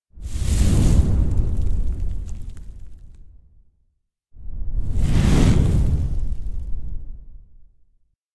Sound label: fire movement